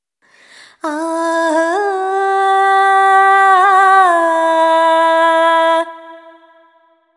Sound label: ETHNO FEMALE, Vocals; ethno2